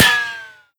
npc armor, bullet impacts; physics; Half Life Model Pack

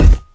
physics cube sm; Portal 2

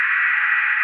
radio random, prison; Half Life Model Pack; Half Life 2; ambient levels

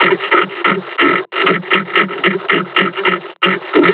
breathing, combine charger; Half Life Alyx

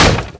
wood box impact hard; physics

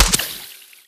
underwater impact bullet; physics; surfaces

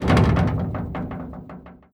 move, hinges drawers; seesaw; Half Life Model Pack; Half Life Alyx

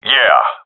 cheer, seal diver; vo; agents; Source Model Packs; Counter Strike Model Pack; CS2

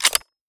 mp7 clip in, weapons; Counter Strike Model Pack; CS2

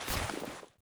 snowball throw; player; winter

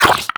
grunt, headcrab, creatures; headcrab classic; vox

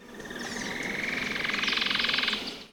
jungle bird; ambient animal; Counter Strike Model Pack; CS:GO

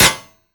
bullet metal solid, physics

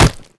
body impact; headcrab classic, movement; creatures

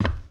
glass, footsteps; player

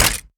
weapon flashbang impact; physics; weapons

